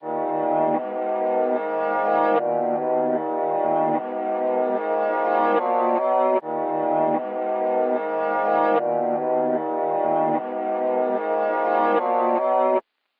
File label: Drill